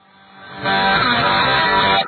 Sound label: guitar; elliot